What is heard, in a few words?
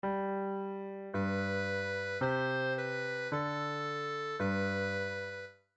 figured bass unrealized